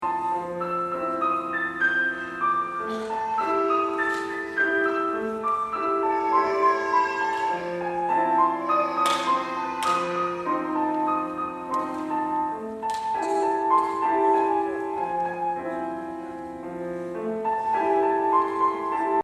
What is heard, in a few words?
piano